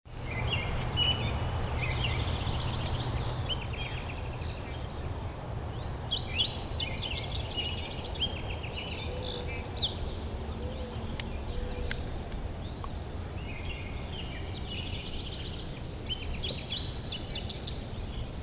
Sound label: Song Birds; Sounds of Nature